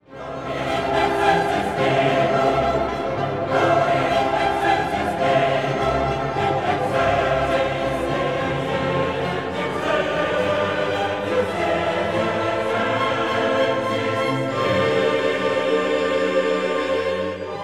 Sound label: Gloria in excelsis Chorus